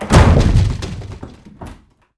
dopad zebrik; Mafie 1

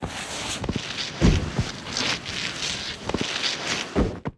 vytazeni z auta; Mafie 1

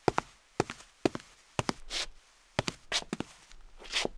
kroky; Mafie 1

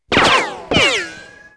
kulka; Mafie 1

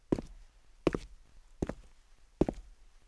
kroky; Mafie 1 cz

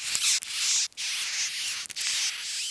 myši; Mafie 1

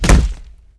kop do tela; Mafie 1

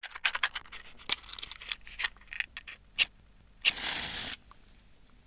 sirky; Mafie 1

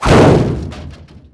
auto rana; Mafie 1

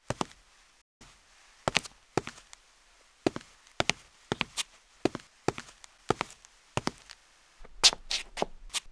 kroky; Mafie 1

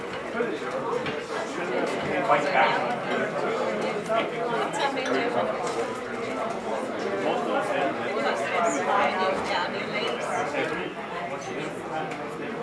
ambi bar; Mafie 1

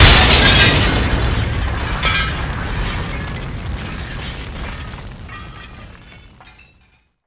okno; Mafie 1

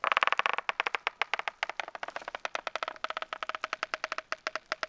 aerostat; Mafie 1

